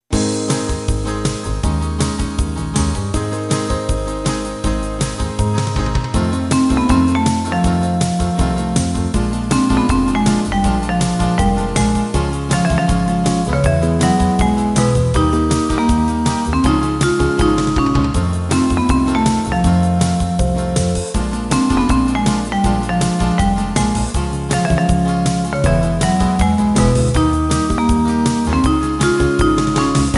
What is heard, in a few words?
Jazz